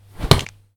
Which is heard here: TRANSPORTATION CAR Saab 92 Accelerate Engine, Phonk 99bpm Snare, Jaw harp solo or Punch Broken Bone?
Punch Broken Bone